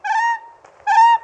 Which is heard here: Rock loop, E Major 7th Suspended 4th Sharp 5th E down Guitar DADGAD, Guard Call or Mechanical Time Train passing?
Guard Call